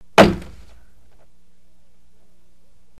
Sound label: death; singe